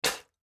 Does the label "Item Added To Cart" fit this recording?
No